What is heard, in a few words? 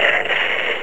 tag on opponent